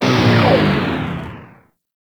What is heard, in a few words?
Proj Large Fire; Warframe; Lizzie